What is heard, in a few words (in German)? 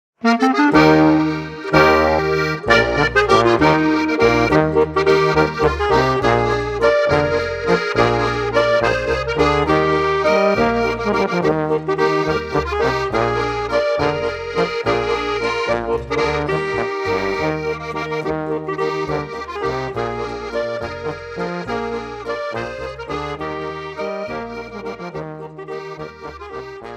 Walzer